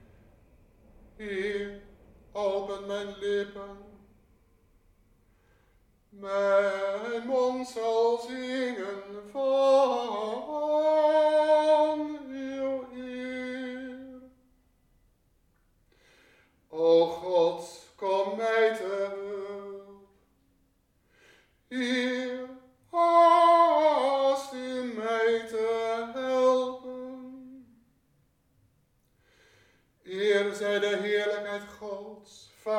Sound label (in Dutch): Preces sopraan